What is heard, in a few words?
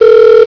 TUUT; psion cd vol1